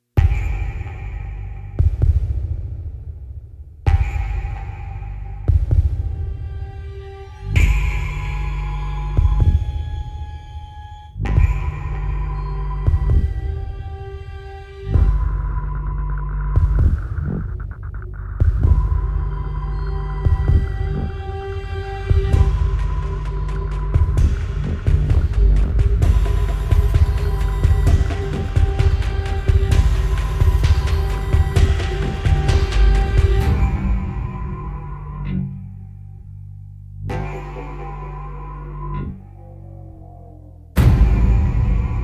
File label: Gloom No Choir; Ninja Tracks